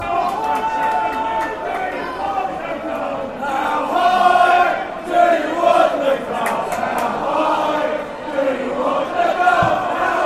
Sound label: how high do you want goal, fanchants